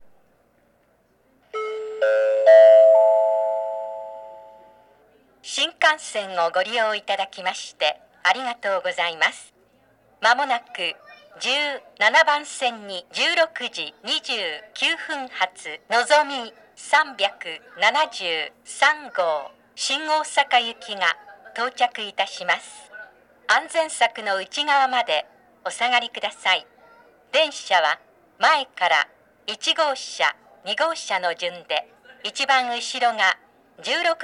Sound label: nagoya s nozomi shin osaka; station; jr-central